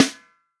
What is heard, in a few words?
snare drum, FUNK SNARE CHROMATIC; REAL DRUMS